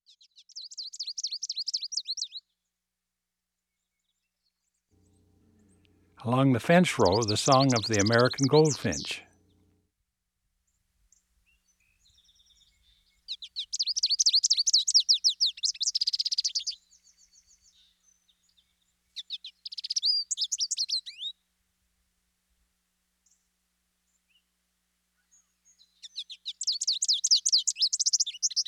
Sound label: American Goldfinch; Eastern North America; Dan Gibson; Wildlife Identification By Sound